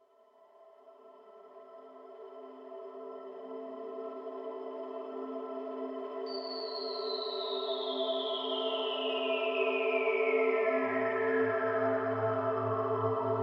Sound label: yttrium ambient; weekly beats 2020